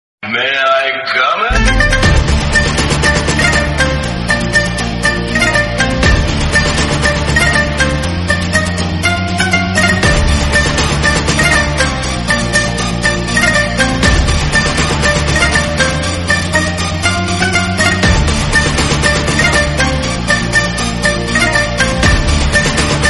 bgm instrumental; kgf

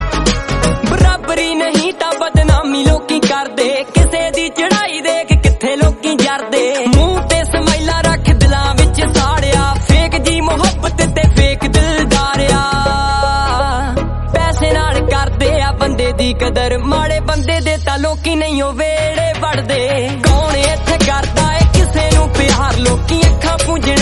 punjabi

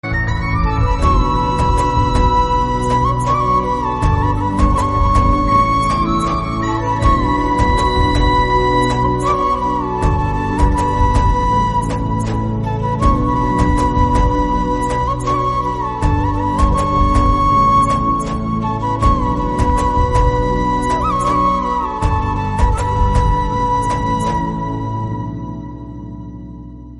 flute bgm instrumental; kgf 2

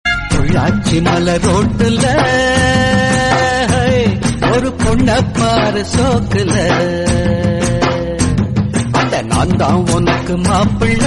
tamil song

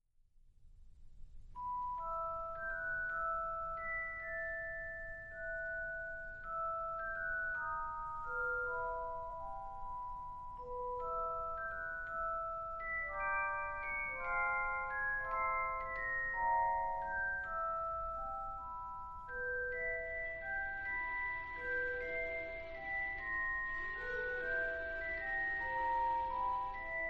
iphone remix instrumental song

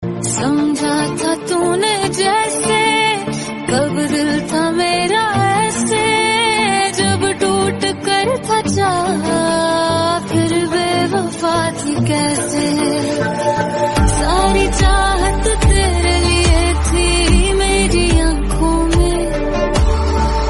pakistani ost hindi

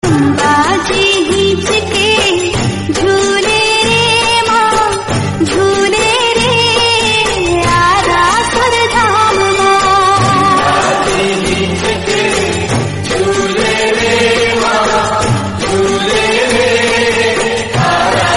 gujarati bhajan

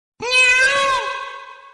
peacock sound

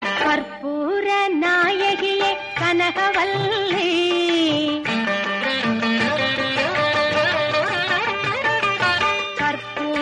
Tamil

